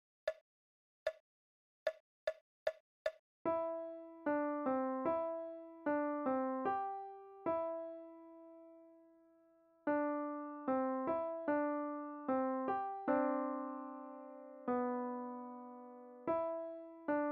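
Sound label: Hot Air Balloon right, piano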